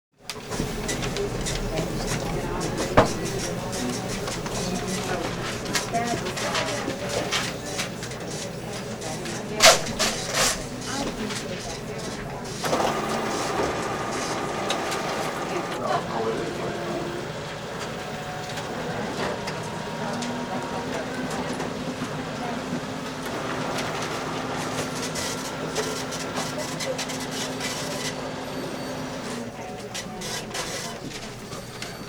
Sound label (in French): Ambiance de bureau; bruitage